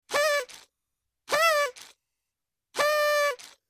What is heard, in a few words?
Party horn